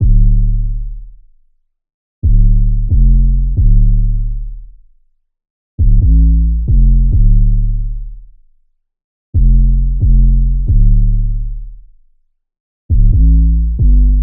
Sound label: 808 loop 135 Dm; Slate Digital BASSHOLE Sample Pack